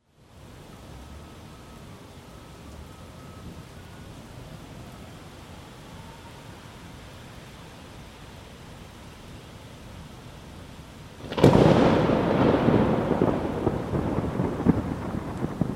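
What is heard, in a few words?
fine dense and sharp loud thunder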